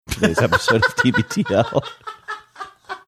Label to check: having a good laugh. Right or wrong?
Right